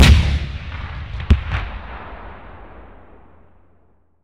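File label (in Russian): одиночный прицельный выстрел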